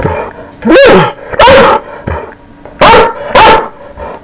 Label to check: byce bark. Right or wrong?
Right